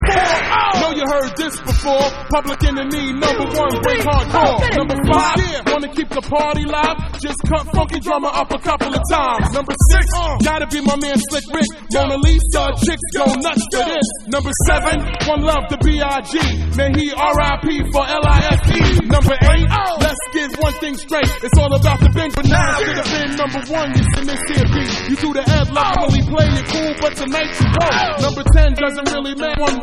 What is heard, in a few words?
BREAKS Vocal Mix